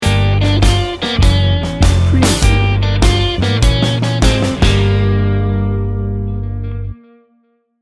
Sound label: quirky blues rock sting bumper